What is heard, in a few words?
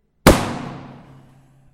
reverb Basement; scripps; Kimbo